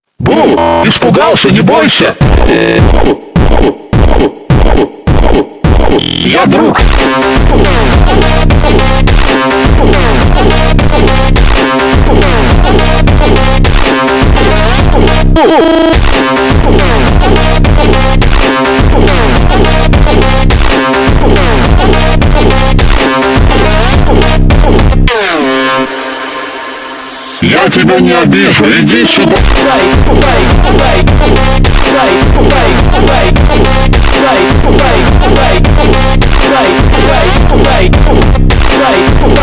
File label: Phonk